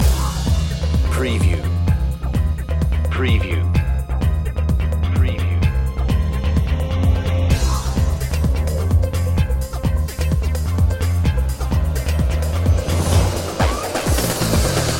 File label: sym groove loop